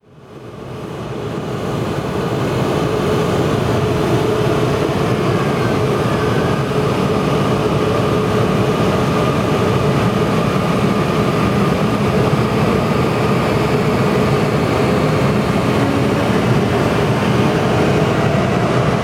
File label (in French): trains diesel et electriques; sonotheque